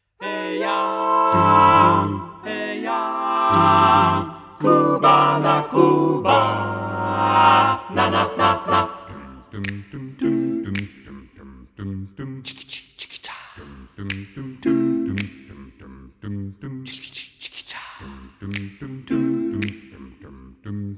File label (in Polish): Piosenka Kubanska